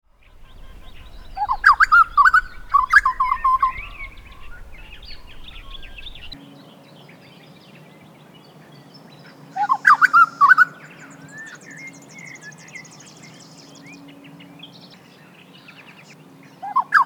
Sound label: Grey butcherbird